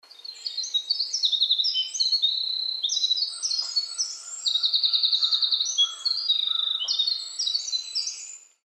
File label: WREN WINTER